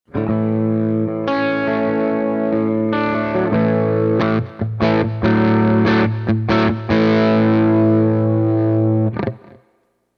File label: soft clipping